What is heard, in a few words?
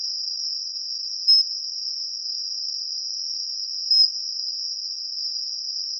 Stumpffia gimmeli